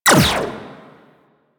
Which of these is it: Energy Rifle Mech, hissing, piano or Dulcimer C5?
Energy Rifle Mech